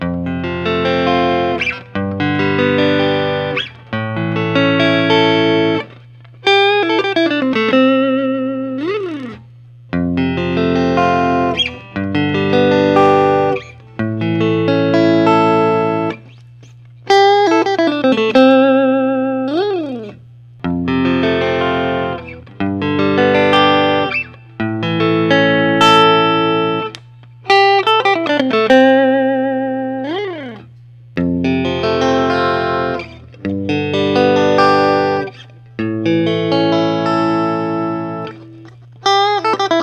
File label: Fender custom shop; jason smith mas